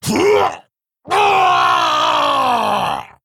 Male Orc Boss Death; Galvangar

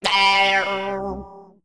Male Mech Death

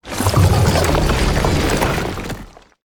Iridescent Gyreworm Death

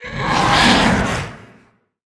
Bittertide Hydra, Play

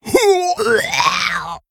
Ogre Death; Rowdy Partner Ogre

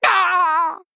Male Fork Death

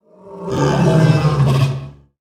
Escaped Mana Saber Death

